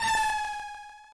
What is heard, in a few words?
SFX Garg Jump